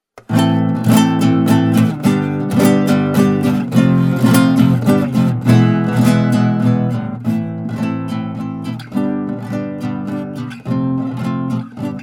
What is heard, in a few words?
Soleares